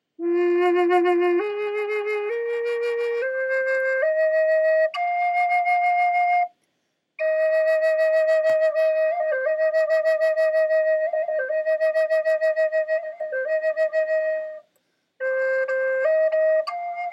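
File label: natural, flute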